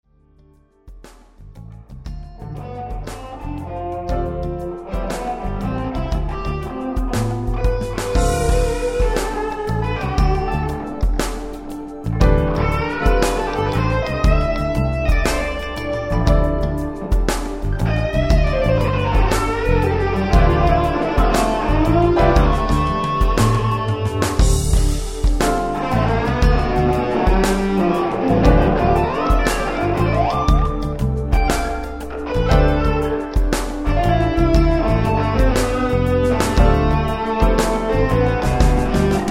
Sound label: FUNK SOLO